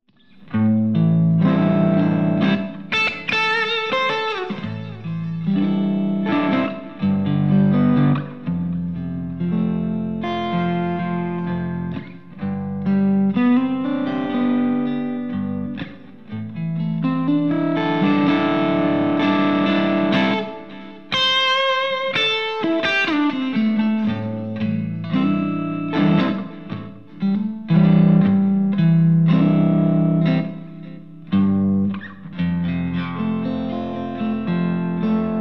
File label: SD1 Half gain